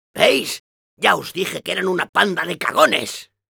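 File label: cheers, Spanish; Demoman; "¡Veís! ¡Ya os dije que eran una panda de cagones!"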